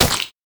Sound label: Meat Explosion